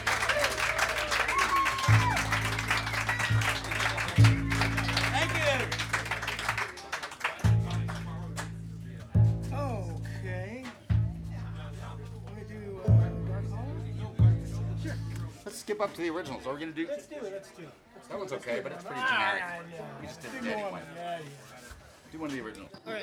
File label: tuning; Keystone Festival 9/14/2025